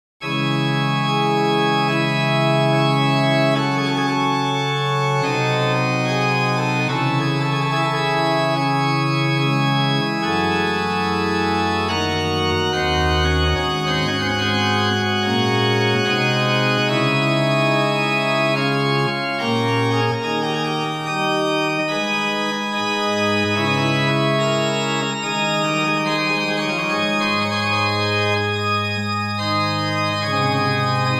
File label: Magnificat Plein Jeu, organ; Dandrieu; roquemaure